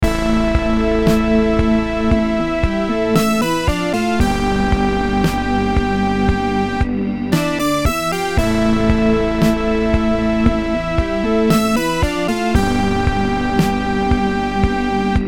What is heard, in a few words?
beat